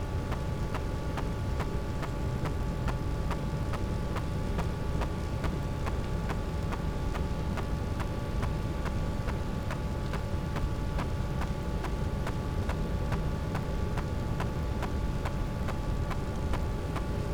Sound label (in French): cachalot negatif, Physeter Macrocephalus